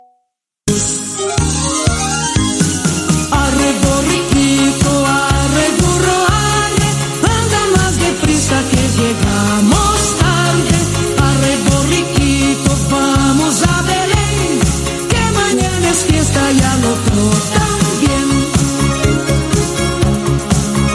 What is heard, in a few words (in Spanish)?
arre borriquito